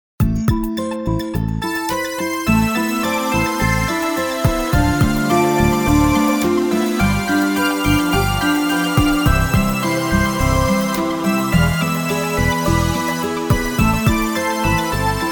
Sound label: edm